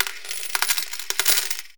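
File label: casino ambience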